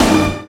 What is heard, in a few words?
HIT LO-FI, Orch.Hits; Roland LCDP08 Symphony Orchestra